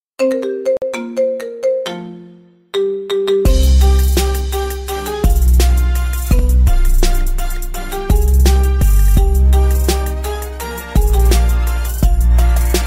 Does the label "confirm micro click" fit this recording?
No